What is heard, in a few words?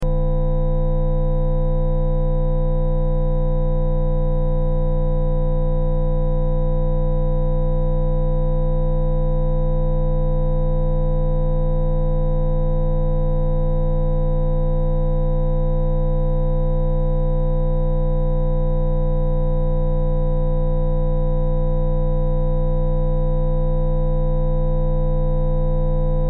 Sci Fi Force Field, Sci Fi Force Field Energy Source Constant Loop